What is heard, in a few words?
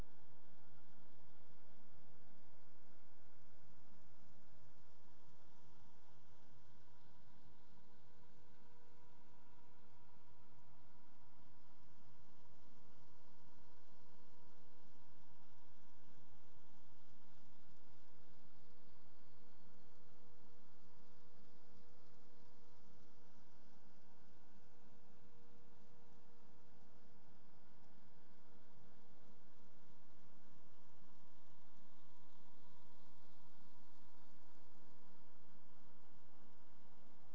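hydrophone; ICLISTENHF; 16000Hz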